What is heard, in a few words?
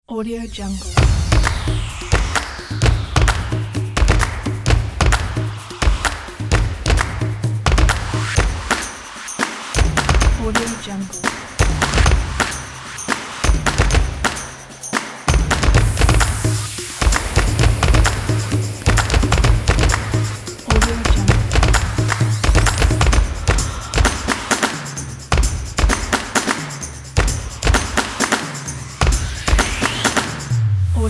percussion